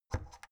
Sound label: oven knob turn hard